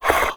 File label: breath fast in; reviver